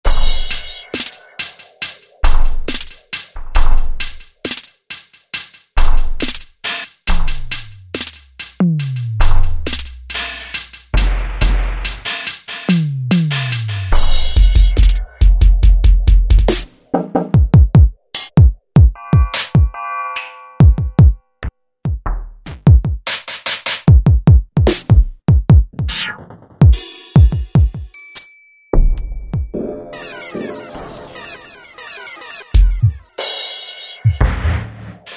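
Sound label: Alien Kit, synth drums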